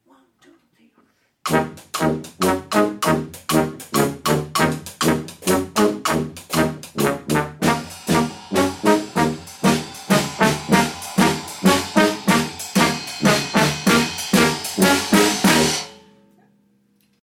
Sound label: Jingle